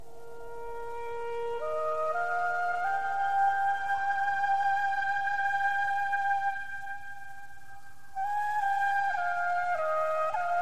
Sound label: Deep Flute Solo